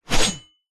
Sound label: sword swing medium whoosh blade ring; zapsplat